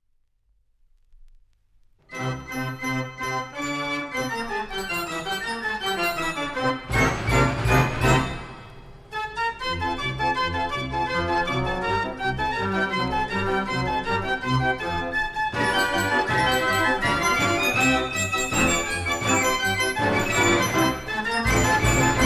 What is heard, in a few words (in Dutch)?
Klokken van Corneville, de grote gavioli 89 key